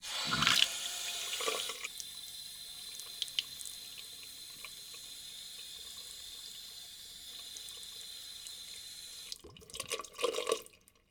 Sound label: sink; NS Studios - ultra sound collection